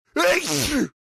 Human male explosive sneeze

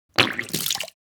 Man spitting spit water

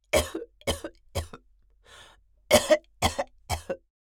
Old lady coughing

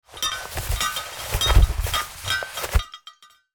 Backpack small items shuffle; foley

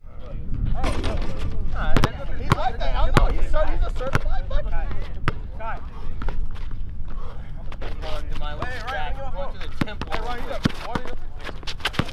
Basketball game ambience street court

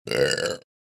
Wet burp short

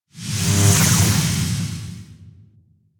Electricity whoosh transition; cinematic

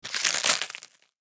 Snail squish break crack; slug and worm

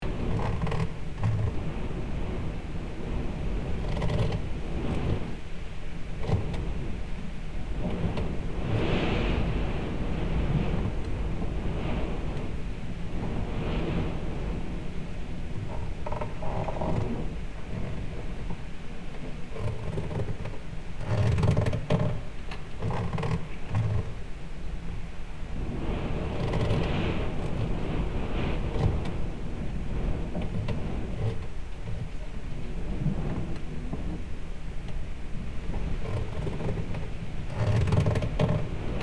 Empty barn interior ambience farm

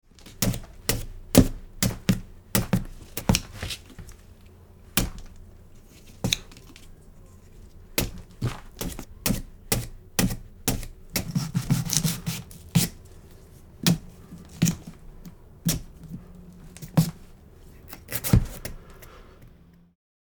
Halloween pumpkin carving

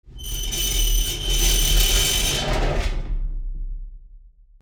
Metal sheet dragging and screeching

